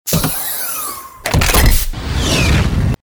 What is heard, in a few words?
recruit action